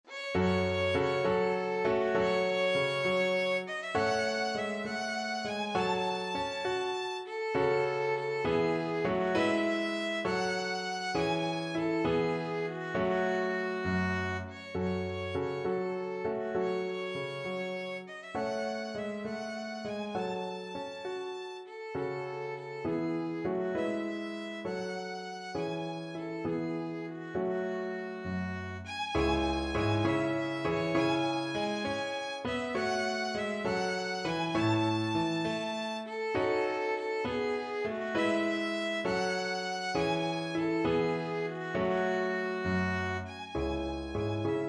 upon a summers day violin

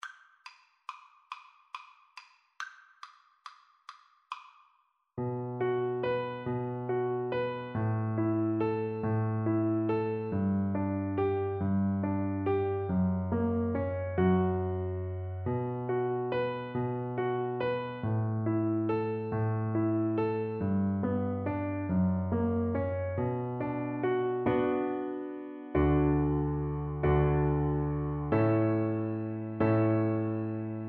Tin Whistle

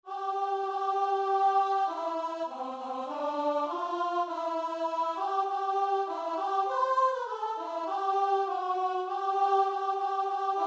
lord bless you, guitar and vocal

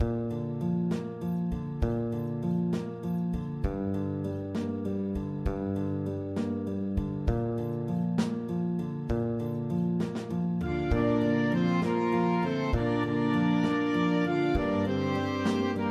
BAND, mixed ensemble

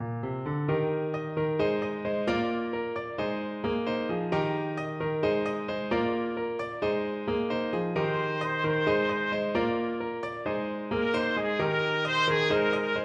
trumpet

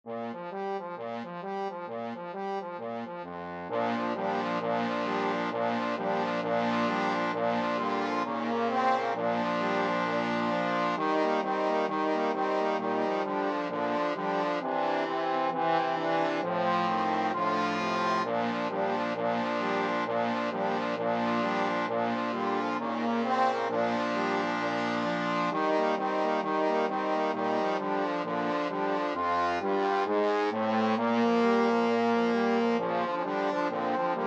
jingle bells boogie brass, trombone quartet

